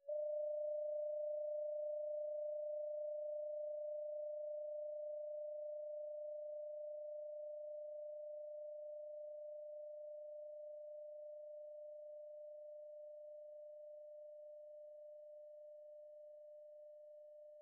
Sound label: singing bowl; pasmo P03; misy dźwiękowe